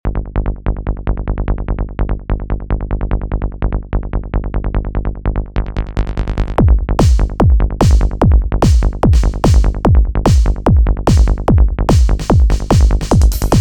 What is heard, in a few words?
Mono Bass, goa trance; Ableton Live 12; instrument racks